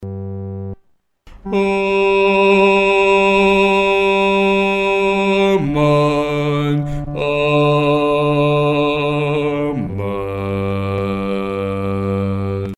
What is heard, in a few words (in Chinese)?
独唱 第四声; 阿门颂（一）